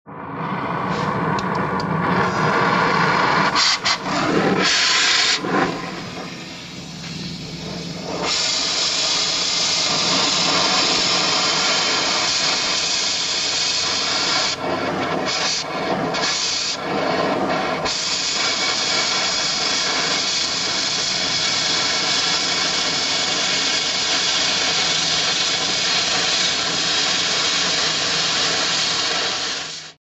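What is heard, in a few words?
gas welding sound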